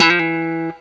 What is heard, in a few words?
BLU TRIPLET, Guitar & Bass; 90 s Sample CDs; Quantum Leap - Guitar & Bass